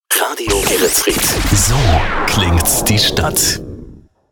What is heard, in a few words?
Jingles Shotgun; Radio Geretsried